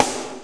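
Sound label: snare drum compress; SNAREDRUMS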